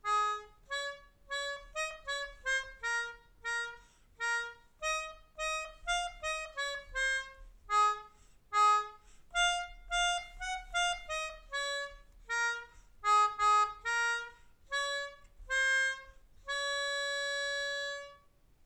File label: Melodica